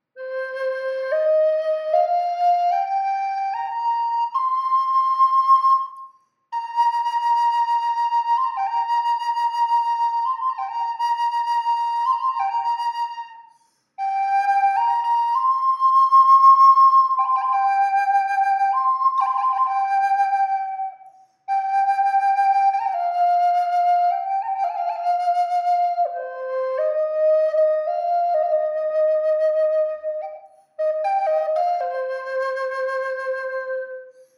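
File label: reverb, flute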